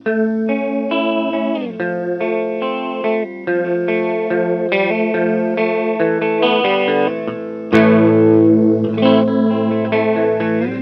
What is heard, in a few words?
Warped Vinyl; Chase Bliss Audio